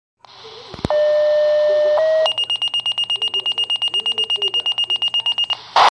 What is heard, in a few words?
EMS Tones; Wayland